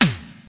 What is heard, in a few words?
Classic Steel Snare Drum Sample C# Key, Royality free snare drum tuned to the C# note